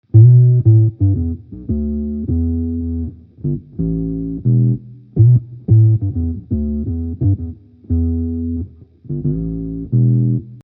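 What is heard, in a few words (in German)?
Vintage Mode Oktave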